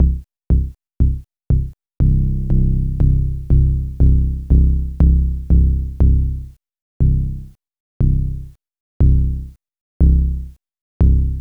Moog bass; AMG - Vince Clarke Lucky Bastard

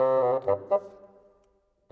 Bassoon, Orchestral; Sonic Foundry (Sony Creative Software); Orchestral VOL-2; Modern 27

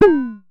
Synare Filter; Star Instruments Synare 3

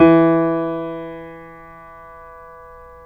PNO, Grand Pno, Roland

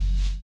HI RIDER; Spectrasonics; Metamorphosis

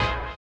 STREET; Spectrasonics; Metamorphosis

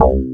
RESO BASS; SAMPLE WAVE MIXING 1

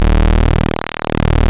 PULSE MOD; E-MU Formula 4000 Series Vol. 3; Analog Odyssey

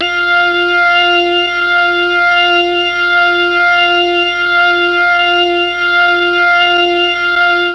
F3 ORGAN, Mellotron MKII; Mike Pinder - Mellotron Archive CD

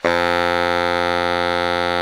BARI FF F 1, Sax; Giga Samples Collection; BARI 3-WAY

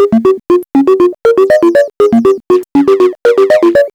arp seq 120; AMG - Vince Clarke Lucky Bastard

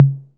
MID TOM; RAP CITY 1

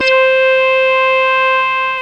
SYN MORP, Roland

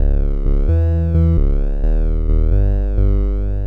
Synth Bass; Sonic Foundry (Sony Creative Software); Electrocution

